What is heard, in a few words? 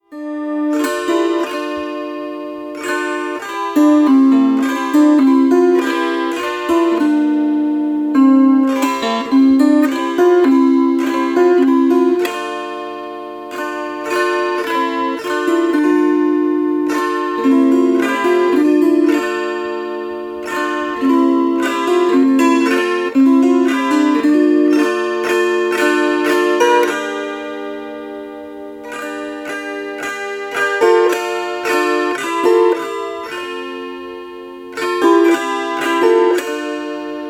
kankles